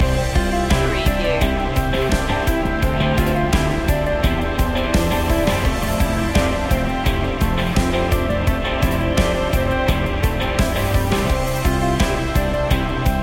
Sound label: pressured loop